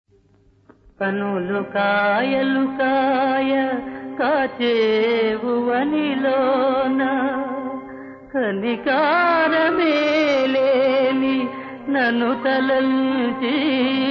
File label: Padyam; NalaDamayanti